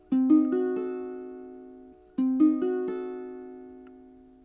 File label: chord C dim7, ukulele